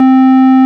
ORG, Farfisa Clarinet

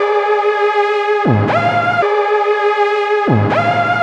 NRG Lead, trance

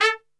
A 2 X PIC SHT, Brass; Best Service; Brass Super Section

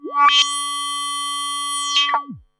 Odd Modular; radiosonics

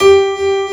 piano G4, 2 Step; USB Soundscan vol.51; House Side Of 2 Step; AKAI; PIANOS

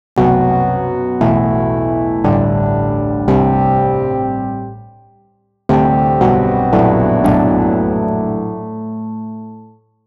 andromeda pad; neuro; Sinistarr; Synth & Bass

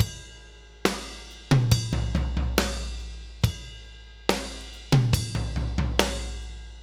Loop, Drum Tools, Laid Back

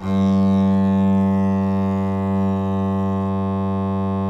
DBL BASS, Synclavier Strings; ILIO

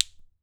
ILI ILI; AKAI S6000 CD-ROM; WorldSounds

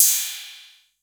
PERC 808

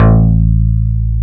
XFM BS, FM Syn Bass, Synth Bass

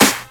SD, Dance Drums

